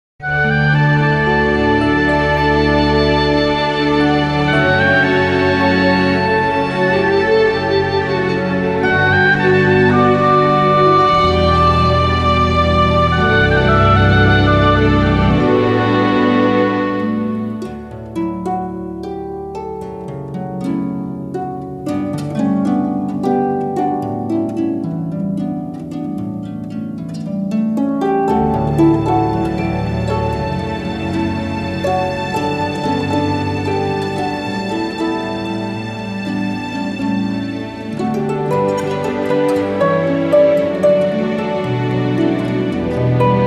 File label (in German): Harfen